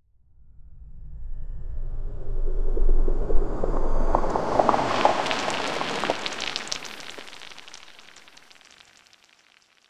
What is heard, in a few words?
a powerful deep and layer, time travel